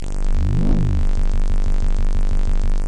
big phasing squirty bass; Basses

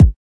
kik

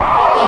vox scratch; Scratching and Turntablism; DRUM LOOPS and BREAKS

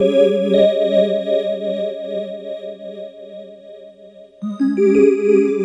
vibrato toy piano, Synth

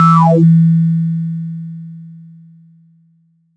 PPG sustained acid e3, multisampled acid synth; Jovica

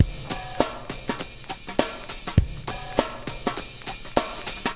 101 compr swinger, DRUM LOOPS and BREAKS; 101 to 110 bpm

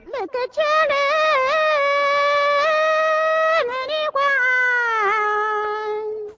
miao female vocal sung, love songs

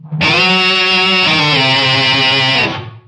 sick lick, Guitar Noizes

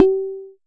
Jomox MBrane, drum machines; Jomox MBrane 11

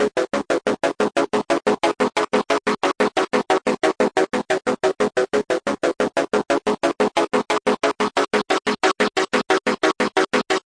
180 two tone 8ths synth

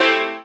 stabs hits, Melodic Stabs and Hits

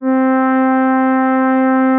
Synthesizer Middle C Notes, Lead

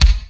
B DRUM, Big and Heavy Real Drum Kit; REAL LIVE KITS

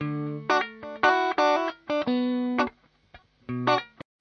strat, guitar

